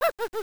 jump off barrell; GBA; MLSS; mario

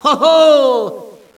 hohooo, Echo inside caves, underground; DS; ML BOWSERS INSIDE; Luigi

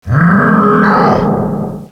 grahhhh, Echo (inside caves, underground ect.); DS; ML BOWSERS INSIDE

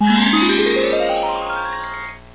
stage enter; GAMECUBE; SUPERMARIOSUNSHINE

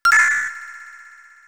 coin frog; SNES; SMRPG

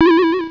mario jumps; ATARI2600; DONKEY KONG

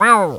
pushing; DS; ML BOWSERSINSIDE; Shy Guys

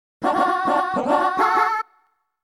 Game Start; N64; SUPERMARIO64

